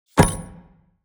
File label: morgenstern grab; player